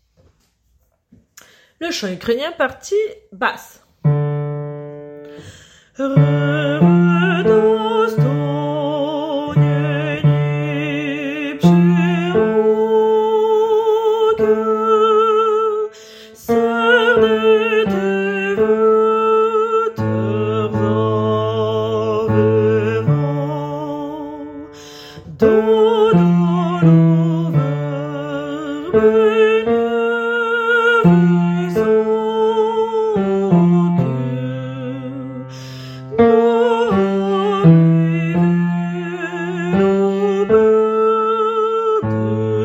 Chant Ukrainien Basse

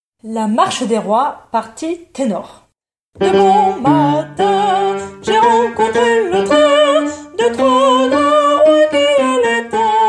La Marche Des Rois Tenor; Bizet

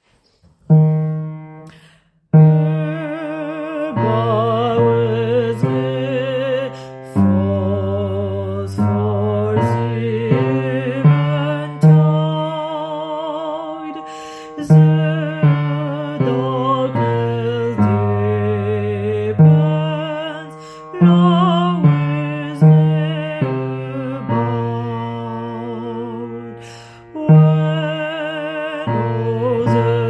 partie basse

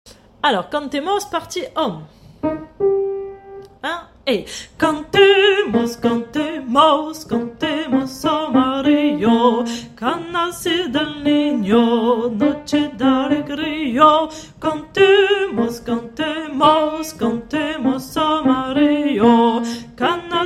partie hommes; Cantemos en entier

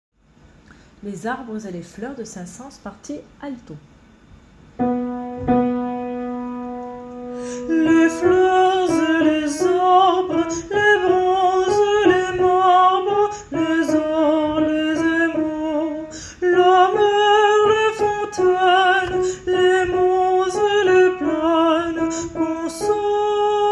partie alto

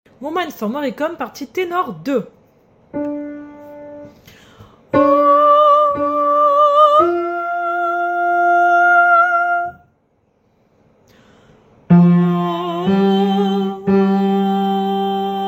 Tenor 2

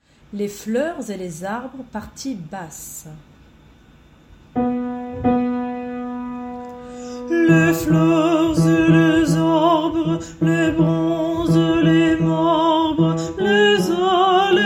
partie basse

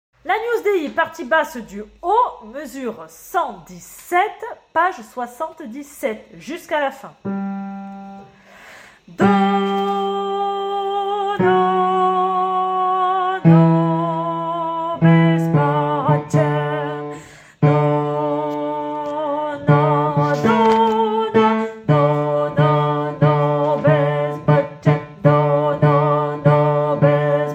basse haut; Agnus dei m117 jusqu a fin

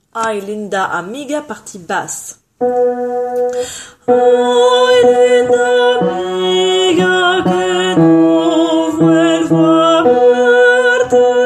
Basse; Ay Linda Amiga

